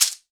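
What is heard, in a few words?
LARGE TUBE, SHEKERE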